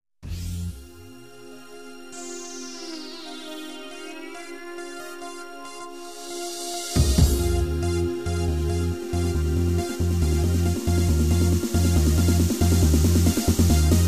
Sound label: disco; ketron